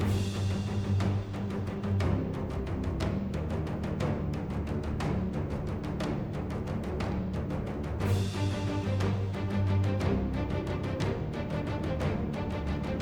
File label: Action 5 (Loop), Rpg Game Tracks; 25 Rpg Game Tracks